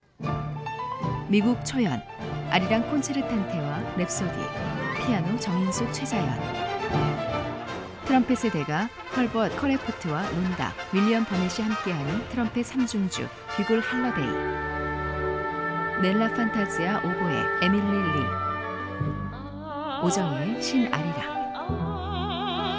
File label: Korean Music; Korean Music Festival